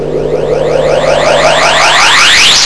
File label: Photon Action; psion cd vol1